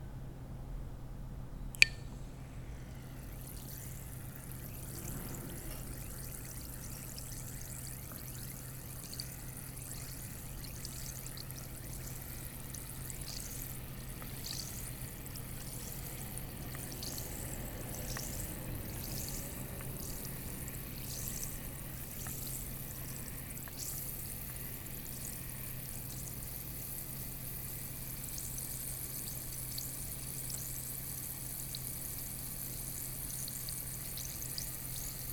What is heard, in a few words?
Fizzle